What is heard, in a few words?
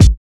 kicks